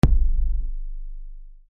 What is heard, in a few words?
boom; Fruity Ass Loops